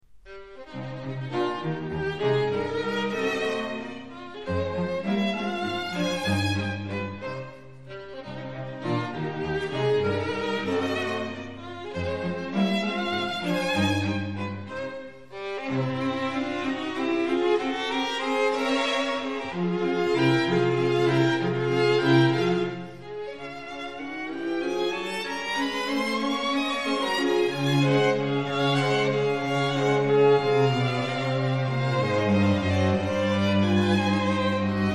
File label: menuetto; beethoven